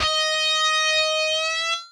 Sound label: bend D#6